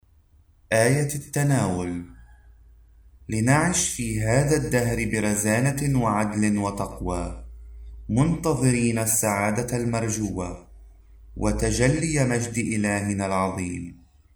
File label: Communion Antiphon; Thursday of Advent